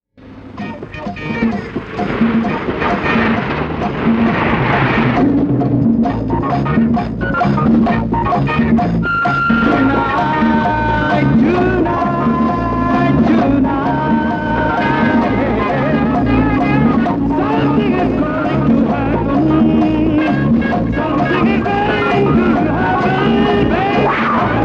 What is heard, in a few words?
Back Ground Music